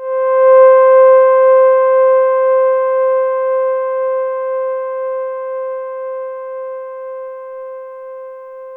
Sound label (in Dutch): Stille Pad, FM; Polyend